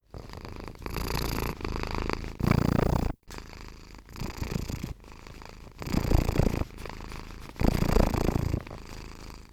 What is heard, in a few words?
cat purr